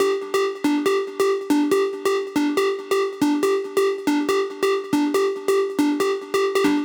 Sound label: SQ Synth WET 140BPM, Gminor; Melody & Synth; Vengeance Samples; Space Of Time Kit